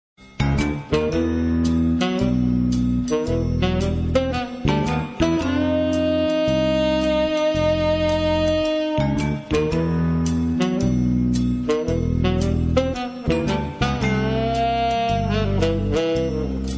Ringtone For Samsung; Pink Panther

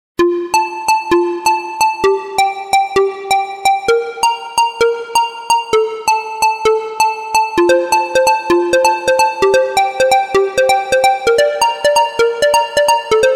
Ringtone For Android Mobile; ringtones

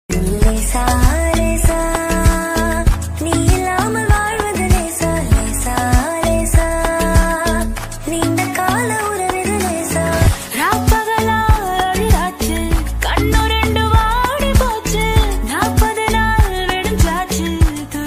Female Tamil Ringtone

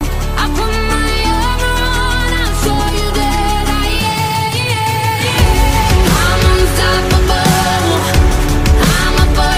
English Ringtone

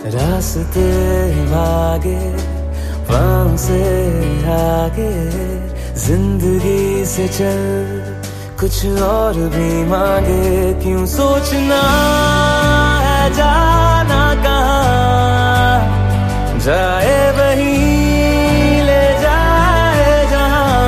Romantic Song; MS Dhoni

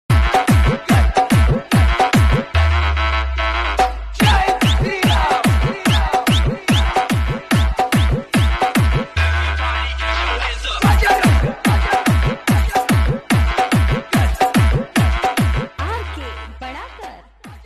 Dj Mix Ringtone

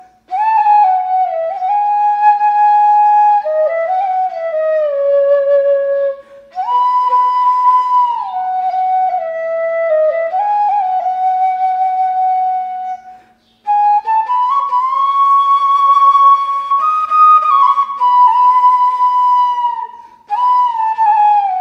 Flute Ringtone

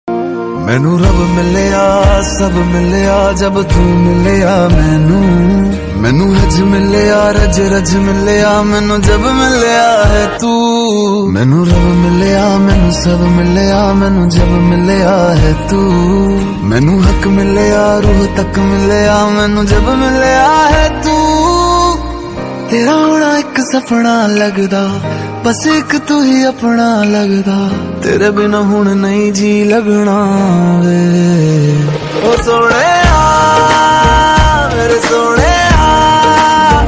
Punjabi Song

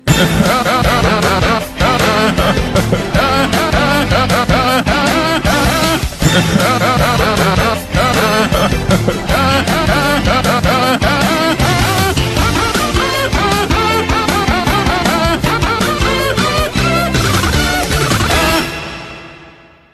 Laugh Ringtone; Kawhi Leonard

